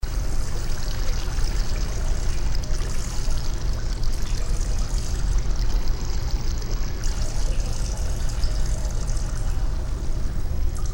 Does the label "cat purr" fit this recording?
No